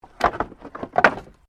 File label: Wood Movement Minor